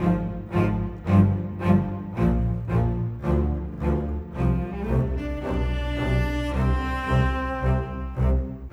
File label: Bass, Cello, Viola, Orchestral, Rock Pop; Sonic Foundry (Sony Creative Software); Orchestral Series VOL-4 - Rock and Pop